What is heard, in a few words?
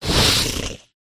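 worm dodge; chervj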